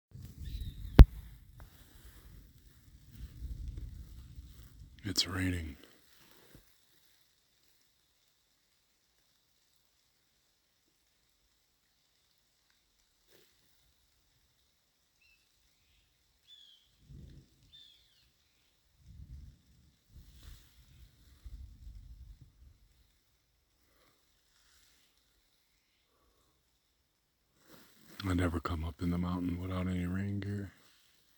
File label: rain